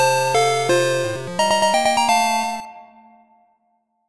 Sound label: Evolution success; Pokemon Essentials; PKMN Estelar 1.3